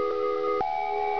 klaxon

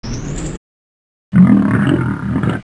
door grunt

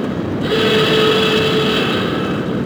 self destruct armed

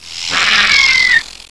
pit worm attack swipe

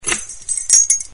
key sound

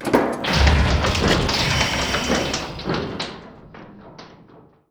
switch

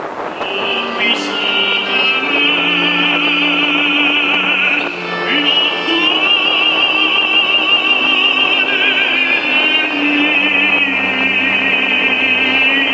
transmission

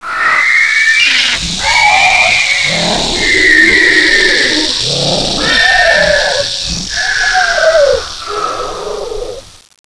pit worm death

